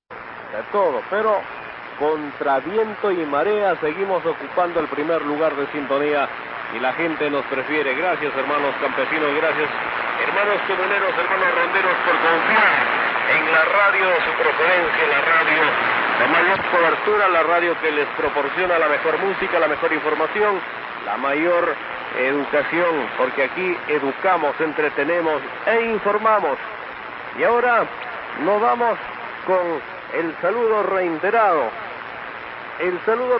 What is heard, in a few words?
6536 kHz; Huancabamba; Peru; R La Voz de Rondero